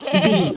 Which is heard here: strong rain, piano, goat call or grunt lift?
goat call